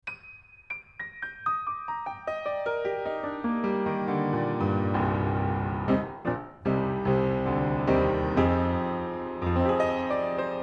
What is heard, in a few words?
Piano, 30cm Omni Pair Lid Off